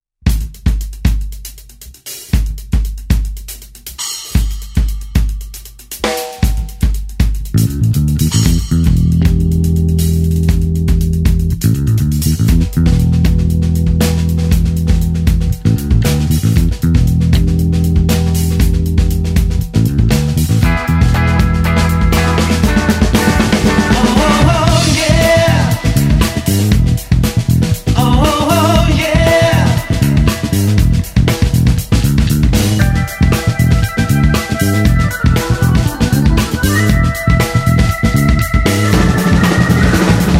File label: Retro Party Instrumental; 3 Nights And 4 Days